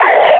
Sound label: pain, combine grunt